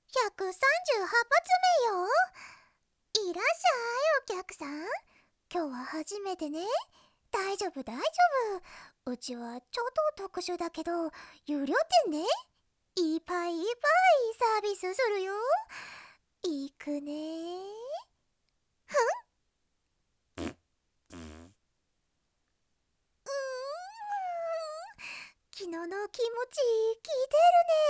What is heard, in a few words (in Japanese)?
放屁SE; 放屁SE収録実演音声集（ミニドラマ仕立て）